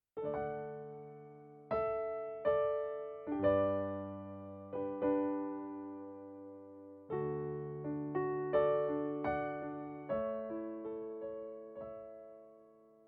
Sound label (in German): Playback D-Dur